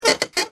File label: creaky chair sound